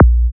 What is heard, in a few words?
Kick Club